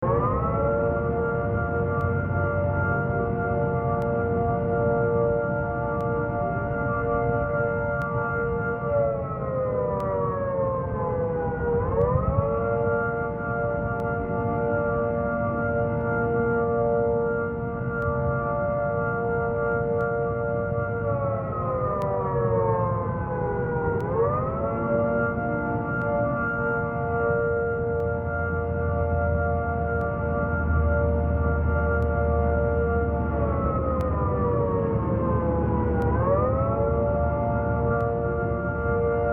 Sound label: siren; sirens